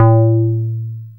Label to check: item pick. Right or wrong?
Wrong